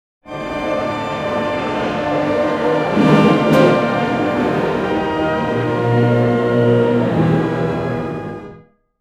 suara orkestra kebangsaan; orchestra

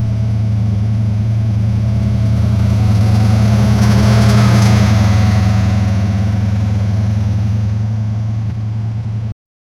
deru mesin truk tentara, jeep

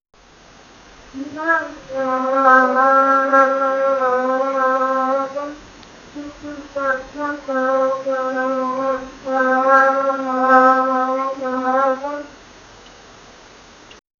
suara ular yang banyak di; nature

